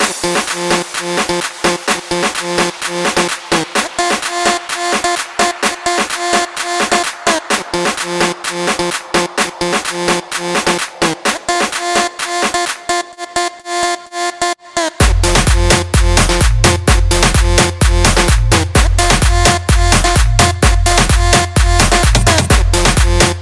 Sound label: Full Loop F